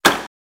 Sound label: Latch Auto Trunk; latches & bolts